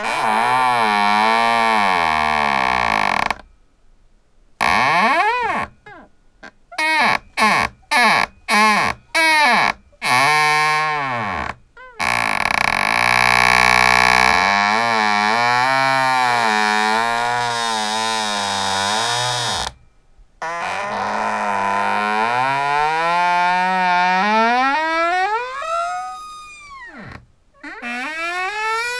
attic wooden door creaking

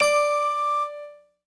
Tone

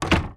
Door Close; Blockman Go; Android

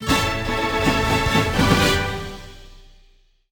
Horse Racing - Token Discovered; mario sports superstars 2017 3ds gamerip

